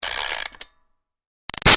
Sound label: Toy Music Box Wind Up Fast; Wind Up Toys